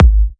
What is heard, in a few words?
G#, kicks; markus hakala kicks